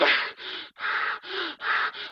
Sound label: exhausted breathing rope bridge; sfx